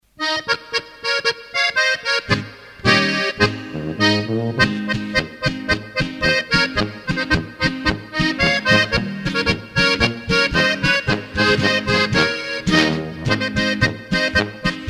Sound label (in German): Plattler; Ambos